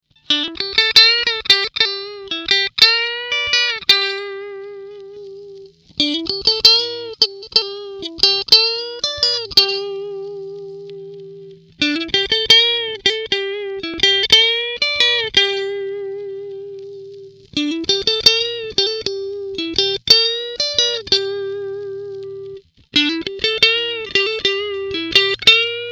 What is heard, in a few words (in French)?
clair, guitare